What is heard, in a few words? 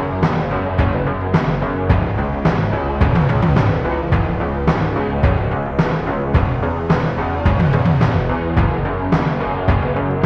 rocknroll